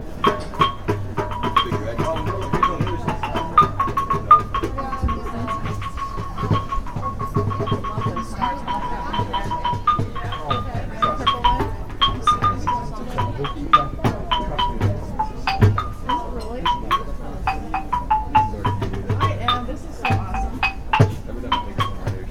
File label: african drums xmas market; heidelberg